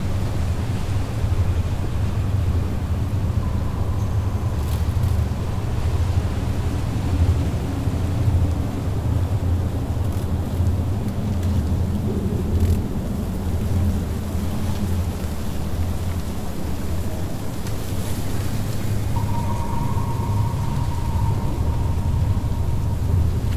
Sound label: Fungus Bog Ambix; Deep Rock Galactic Boardgame